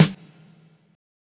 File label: SDA, Disco Fever drum samples; Best Service ProSamples vol.48 - Disco Fever; AKAI